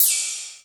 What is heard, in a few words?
E-Mu Proteus FX Wacky Ride Cymbal